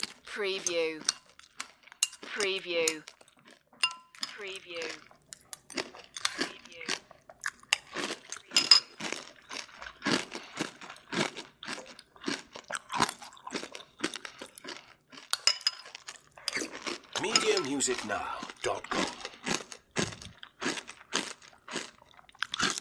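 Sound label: Chowing Cereal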